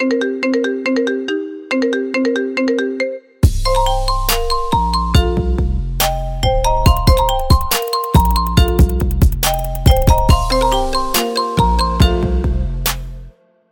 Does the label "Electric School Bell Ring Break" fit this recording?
No